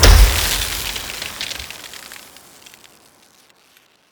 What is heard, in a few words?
Explosion Ice; goddess of trampling